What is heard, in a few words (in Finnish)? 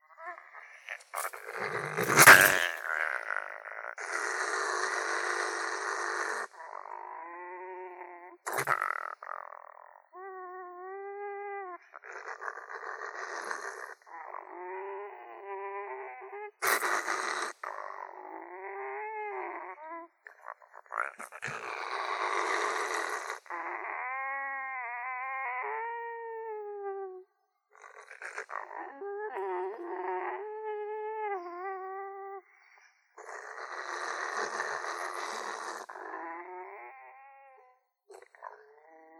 supikoira